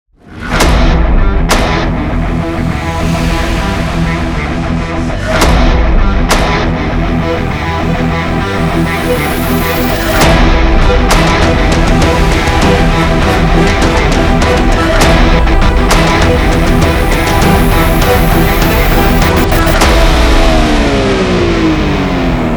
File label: Cinematic Rock Trailer; powerful, massive, intense, aggressive and epic trailer